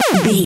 retro laser gun shoot

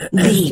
voice male cough interrupt

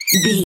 voice fun mouse

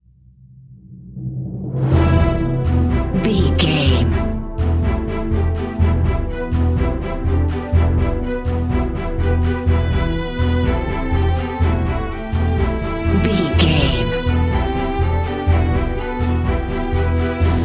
music epic fallen empire

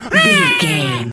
monkey hurt scream

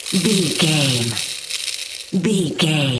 dirt rice pouring gravel debris

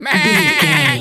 goat baa stressed hurt

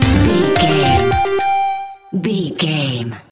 retro musical stinger

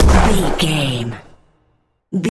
explosion small

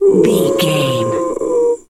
seal walrus death

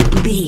footstep metal land

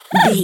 goblin fairy effort jump action

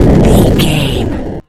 retro explosion deep

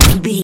gun silenced pistol shot

